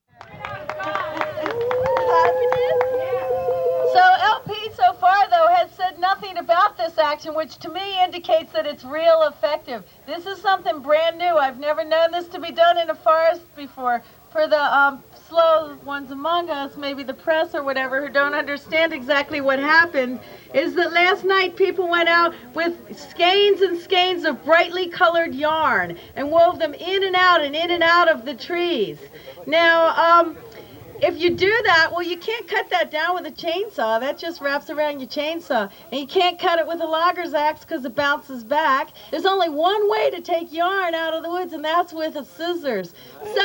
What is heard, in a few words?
Yarning